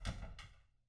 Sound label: door wooden; angband